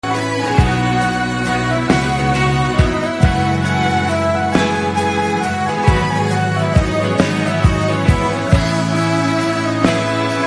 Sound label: BG Ringtone